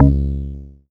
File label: C#, Synclav FM PLUCKED; Big Fish Audio